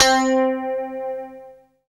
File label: guitar, e-guitars; Sound & Vision; Gigapack I CD 2; GUI E-Guitar 3